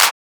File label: Clap, Techno; Techno Sounds 2024